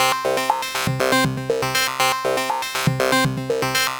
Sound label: Synth